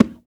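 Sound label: conga, African Perc; Spectrasonics; Supreme Beats; African Contemporary; SB Afro DRMs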